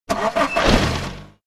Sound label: KART Engine start